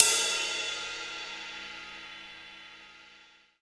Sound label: XV Ride, Roland XV-5080